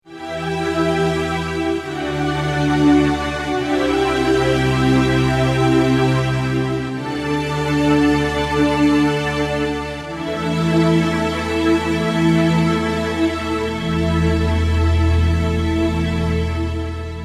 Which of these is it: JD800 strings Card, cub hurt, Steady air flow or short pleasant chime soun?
JD800 strings Card